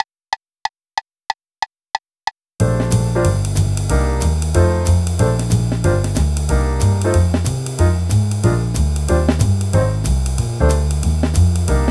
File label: Rhythm Changes in Bb